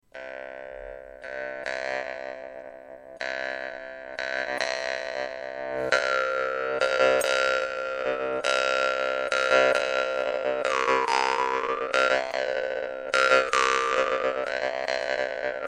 russian vargan

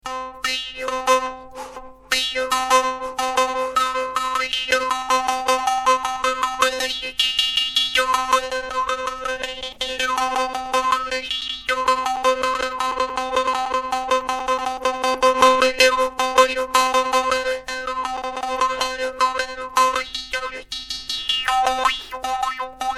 tatar micro, tatarskii mikro kubyz; vargan

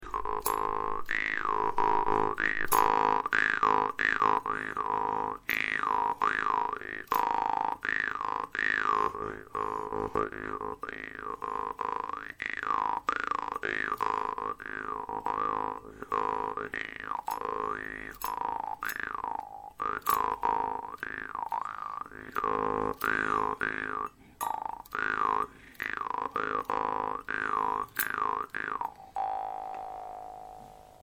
doromb, Tibet; hungry doromb; vargan

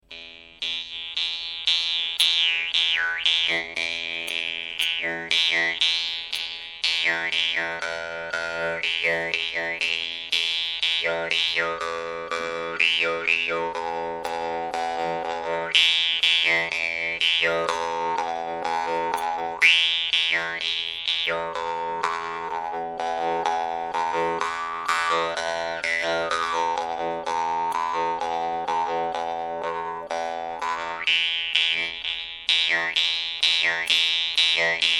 vargan kapla; russian vargan ustinov big exkl

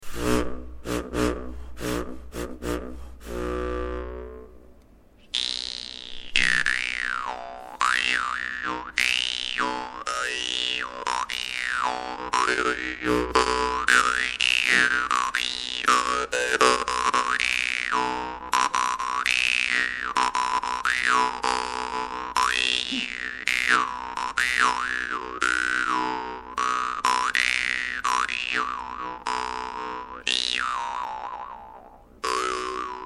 small, vargan; kokov

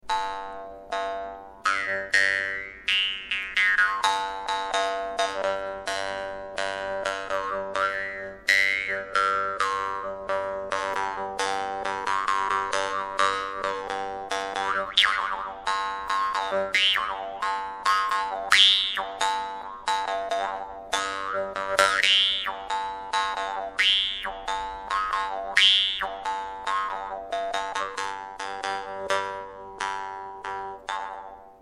dan moi ovoid; strannyj

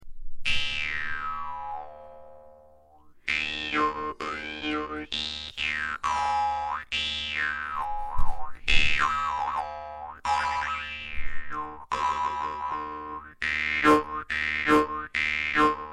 tatarski kubyz big; vargan

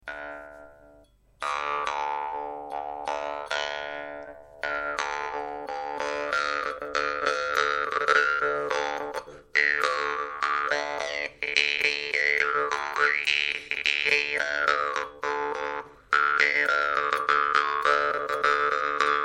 dan moi; vargan

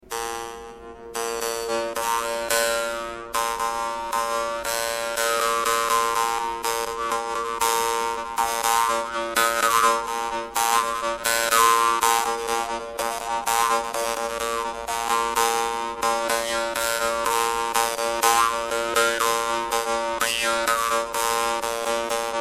dan moi mini; vargan

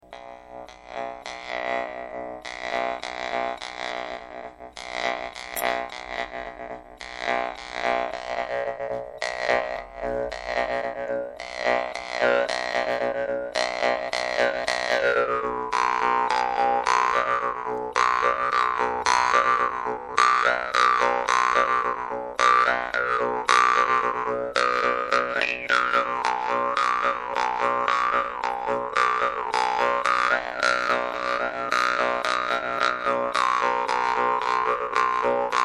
vargan; ust big long 81-87; ustinov

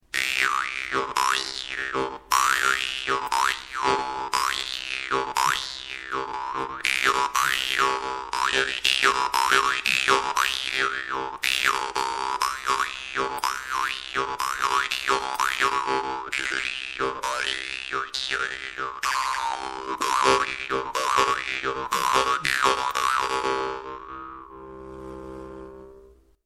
parmupilli C, parmupill middle, vargan

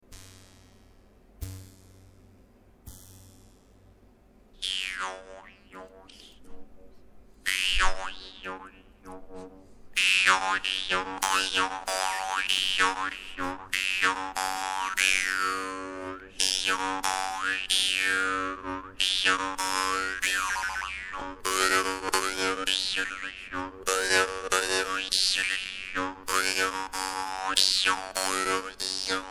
mini dan moi

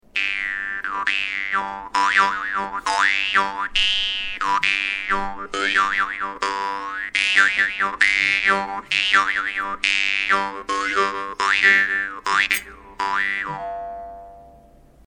doromb bull G; vargan; hungry doromb

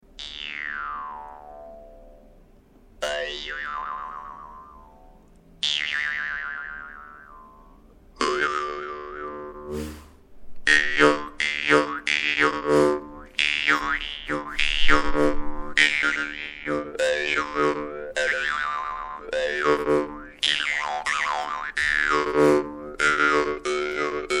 vargan standart; ustinov 87; ust big long 81-87

